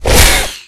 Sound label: Weapons; SFX Weapons Linkgun